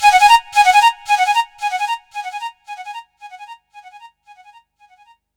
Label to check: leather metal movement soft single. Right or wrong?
Wrong